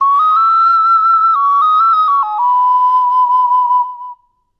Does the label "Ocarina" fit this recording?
Yes